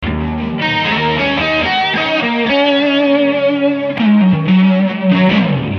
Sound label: Eb 080 100 solo Wah